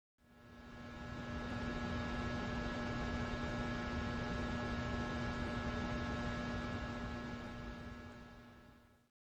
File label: hum; Minecraft; liminal-industries; Liminal Resources